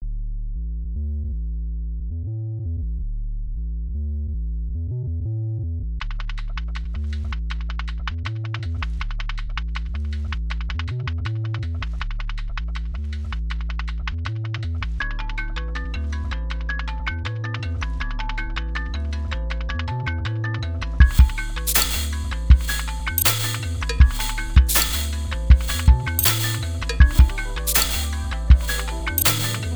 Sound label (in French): accordeur